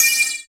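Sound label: PER PROCU, Procussion, percussion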